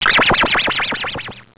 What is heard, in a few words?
Player Death; Bombzuka; Retro